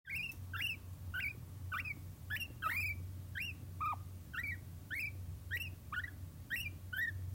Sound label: chirping, 力強さはなく、2 kHzくらいの弱々しい声で断続的に鳴きます。; 尿路結石のため頻繁に（多くは排尿時に）鳴きます。; 1歳11ヶ月22日; guinea pig; この声に似た鳴き声は、尿路結石発症後、餌が欲しい時などでも発生するようになりました。